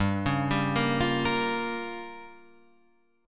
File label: G Minor 7th Flat 5th, Guitar Standard; Gm7b5 arpeggiated; JGuitar